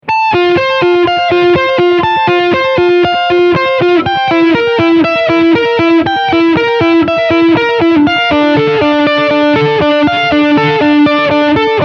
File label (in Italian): accordi su scala Fa discendente, guitar